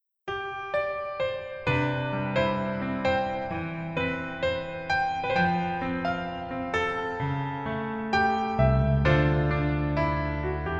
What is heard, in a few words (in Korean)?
Piano ver 멜로디 MR